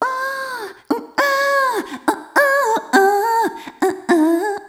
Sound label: Rhythmic A